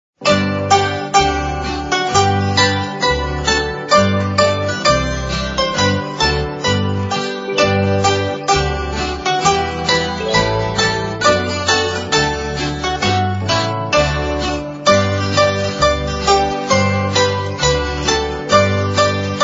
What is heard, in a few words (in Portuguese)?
Cantam Os Anjos Harpa De Natal